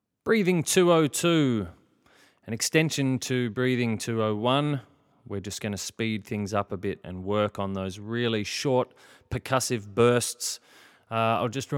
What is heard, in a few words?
breathing, singing